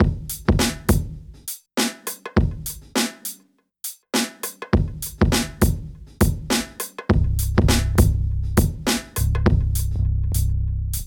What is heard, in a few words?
Digitakt Vintage